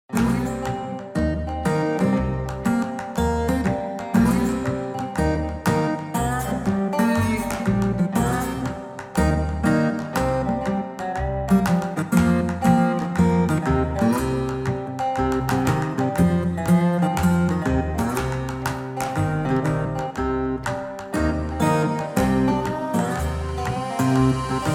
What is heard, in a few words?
Delta Slide